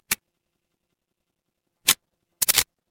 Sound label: office camera